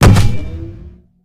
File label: bumper car hit ball; tf